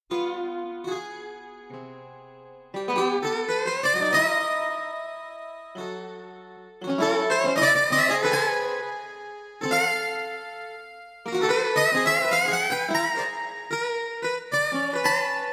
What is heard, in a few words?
Koto